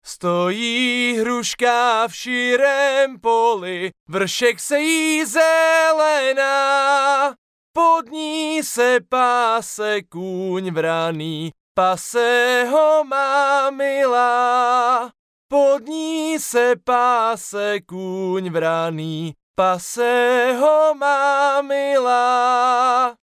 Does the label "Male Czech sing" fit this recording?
Yes